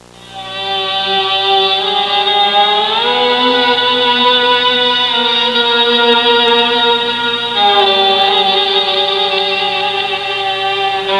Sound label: ARABIAN